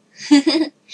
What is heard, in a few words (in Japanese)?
ふふふ